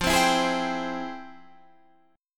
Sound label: F Minor Major 9th, Guitar Standard, chord; FmM9 strummed; JGuitar